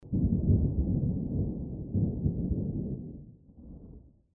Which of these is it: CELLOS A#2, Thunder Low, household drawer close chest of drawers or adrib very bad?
Thunder Low